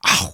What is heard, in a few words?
Bite